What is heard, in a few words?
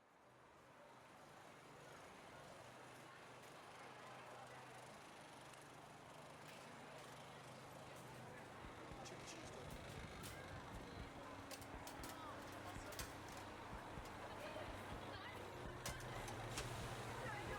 Bag Breakdown surround mixdown; QLAB; overture center for the arts madison wi